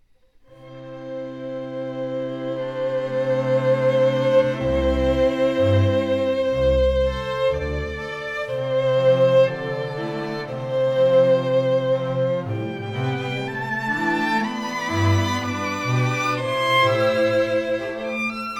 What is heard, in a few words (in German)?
streichquintett fadeout